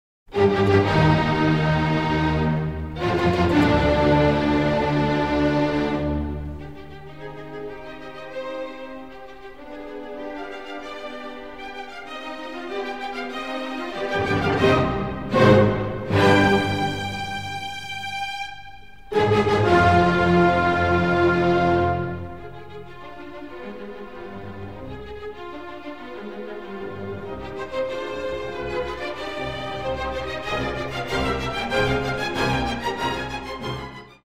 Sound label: Symphony 5 1st mvt; Beethoven